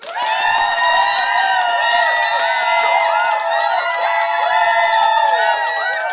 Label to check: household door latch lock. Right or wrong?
Wrong